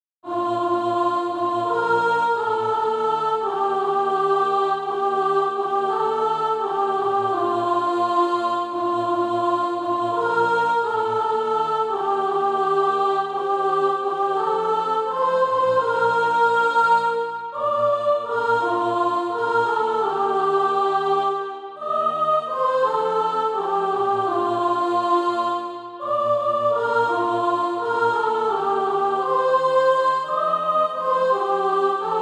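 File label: sop, Practise Music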